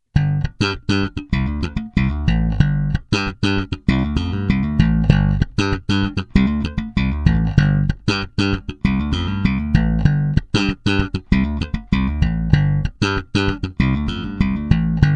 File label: Yamaha bb std 2 slap